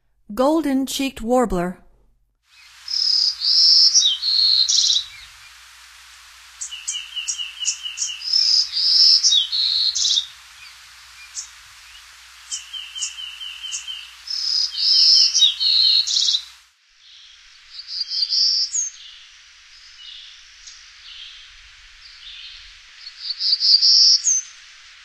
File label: Golden-cheeked Warbler, Songs of Birds of North America; Stokes